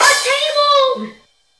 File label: soft hitfinish; osu!